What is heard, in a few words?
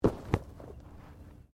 Body movement Foot Foley